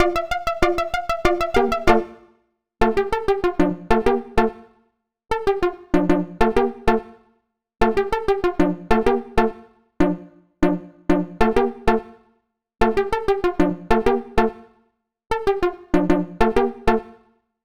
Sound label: Popp Keys; Sonic Foundry (Sony Creative Software); Club Joints and Street Anthems; Toxic Rain